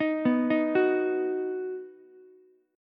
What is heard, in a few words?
Eb Minor Sharp 5th, Ukulele; Ebm#5 arpeggiated; 8,11,11,9; JGuitar